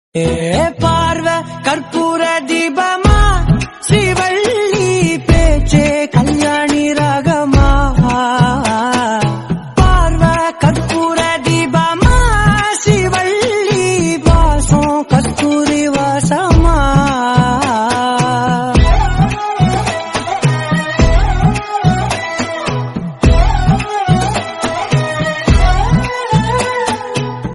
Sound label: tamil ringtone